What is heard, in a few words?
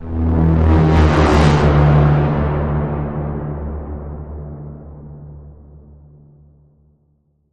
Bend, E